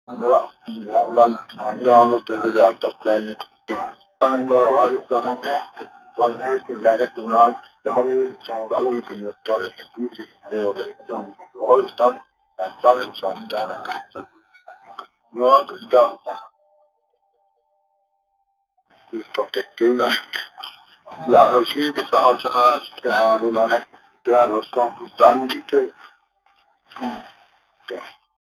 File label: bass guitar